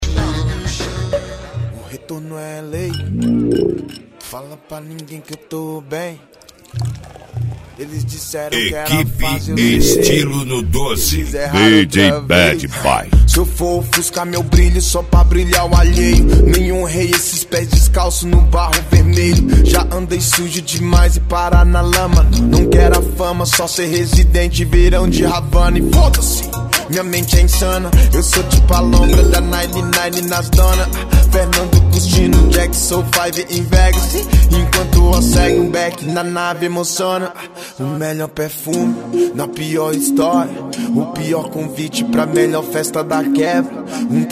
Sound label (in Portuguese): funk conciente e hip hop nacional